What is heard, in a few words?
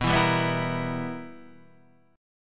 B Major 7th Suspended 4th Sharp 5th, chord; BM7sus4#5 Chord {7 7 5 4 5 6} strummed